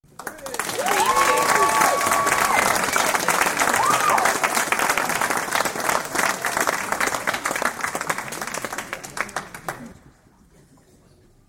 Audience Applause; beanstalk audio

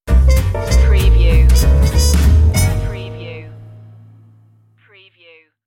feel good swing stinger

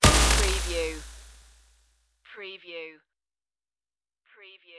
futuristic bullet impact

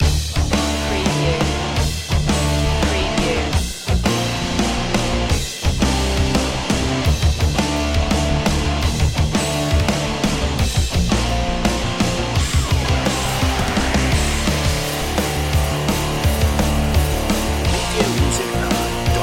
8bit rocktronic underscore

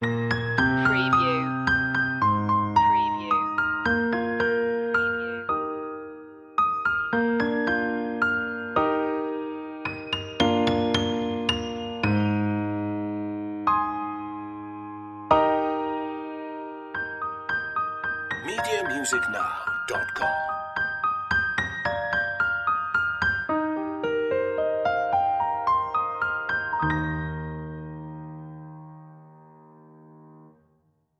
piano; the holly and the ivy; media music now

